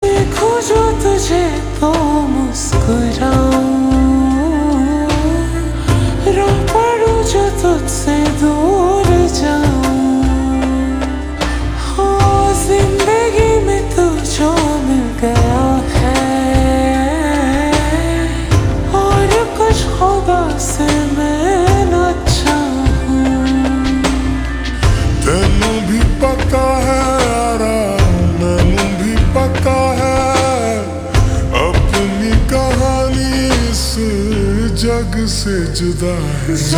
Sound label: Ringtone slowed and reverb